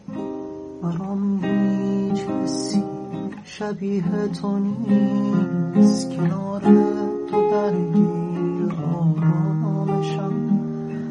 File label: Guitar